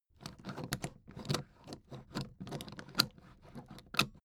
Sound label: suitcase handle handling